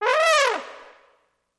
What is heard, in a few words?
Trombone Elephant Call